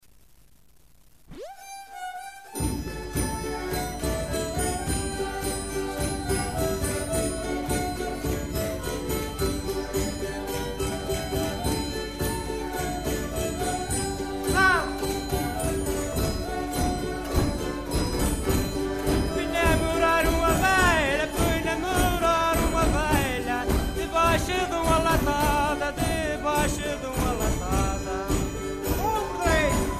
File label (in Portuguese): Baile Pesado, Repisa